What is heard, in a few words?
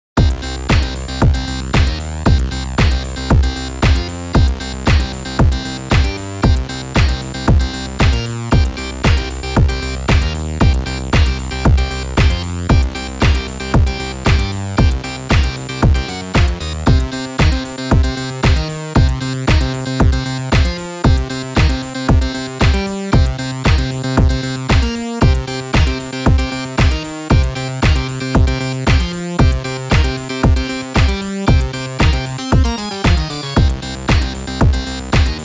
karaoke